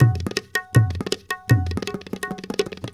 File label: INDIA R, IND ENS PH, Heart of Asia; Spectrasonics - Heart of Asia CD2